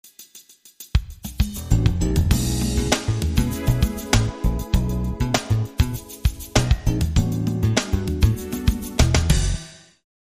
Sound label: Jazz